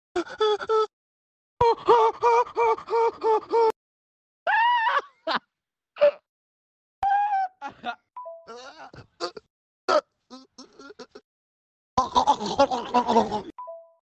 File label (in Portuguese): fingindo choro